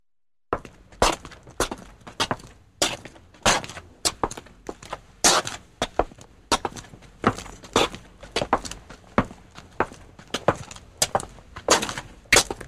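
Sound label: shuffling footsteps; shagi sharkayushchie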